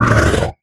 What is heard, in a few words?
kranagull scream; kraak